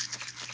grinder grind

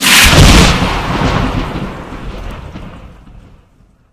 discharge, dragonsbreath; stcop

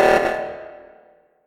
alarm sabotage

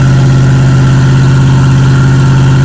v8 diesel, engines; acf engines

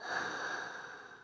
bong exhale mid

